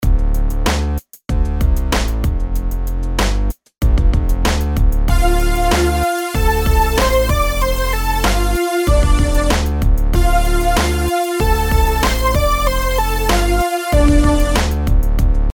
rhythms eighths; simple 8s

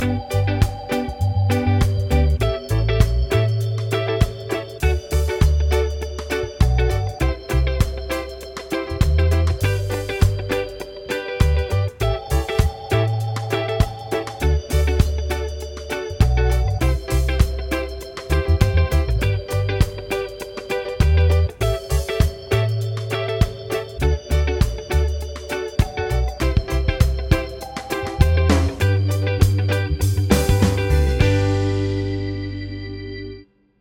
progs with applied chords

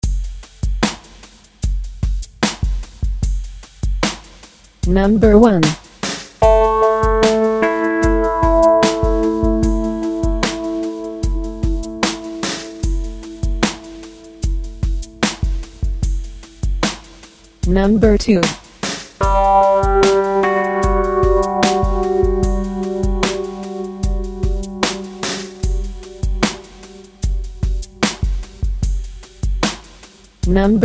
intervals maj up; 8up no drone

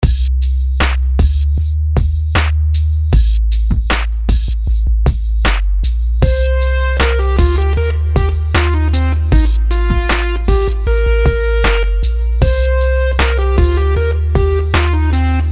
rhythms simple 16ths

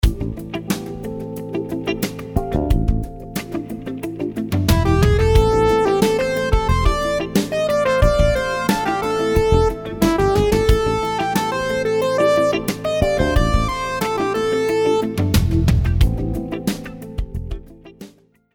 rhythms 16s syncopated

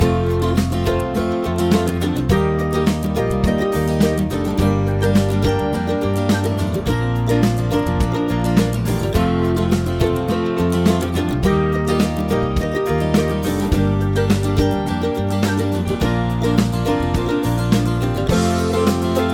chord prog with mixture

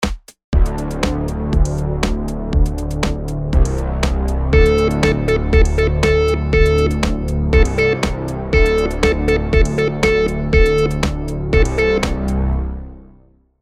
rhythm simple, monotone

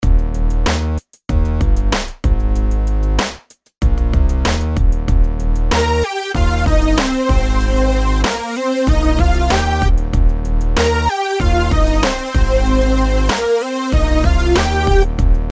melodies simple Ebmaj; melody

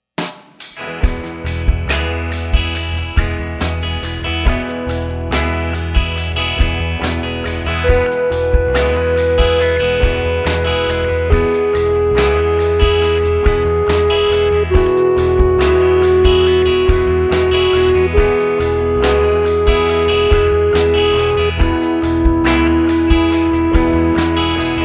scale degree

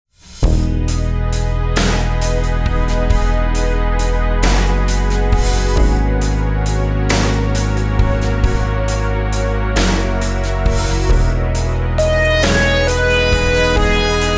rhythms compound 8s w16s

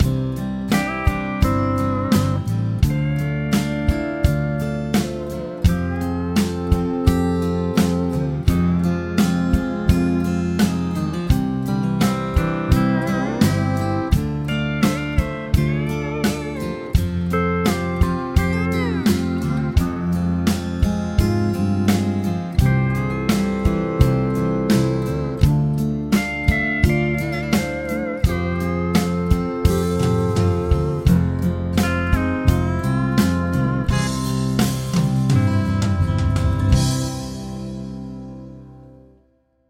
chord prog with mixture

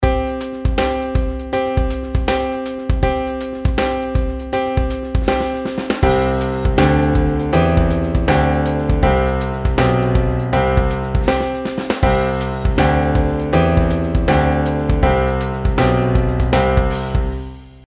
pitch patterns stepwise

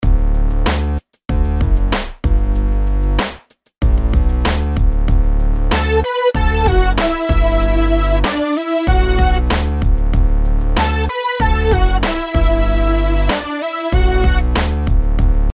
melodies simple Dmaj; melody

